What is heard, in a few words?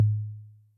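Analog Tom, Yamaha DTXpress; Electric Tom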